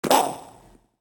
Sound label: boardwalk pain